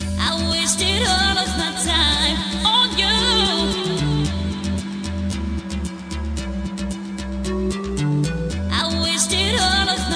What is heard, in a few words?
happy music